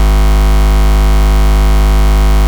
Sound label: Bass, Root G#; Vengeance Samples